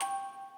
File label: musicbox